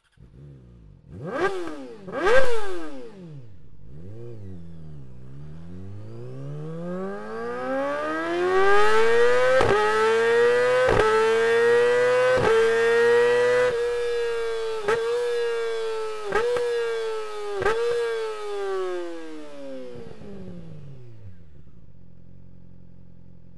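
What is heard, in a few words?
complete without noise insert, exhaust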